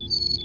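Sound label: clavinet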